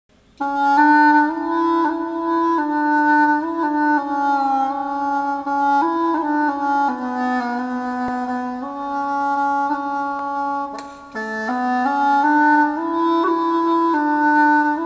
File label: hulusi; shifanyuequ